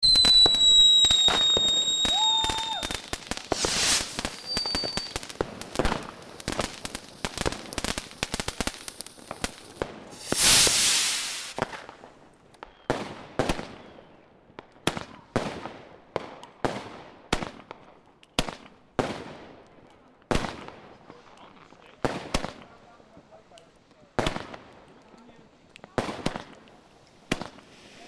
silvester fireworks close to two neighbor parties on street